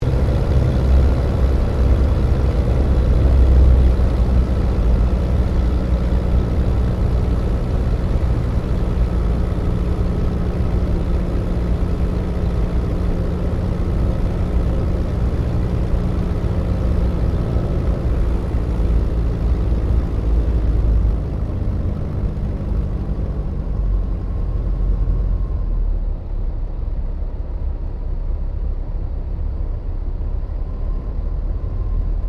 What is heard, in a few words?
AIRCRAFT DH82A DEHAVILAND TIGERMOTH LANDING INTERIOR; RAAF Tigermoth; Airplanes (Sound Effects:Vehicles)